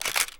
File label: camera; cameraman3